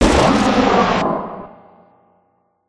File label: cry